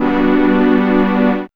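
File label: synth chord; E-Lab; X Static Goldmine 1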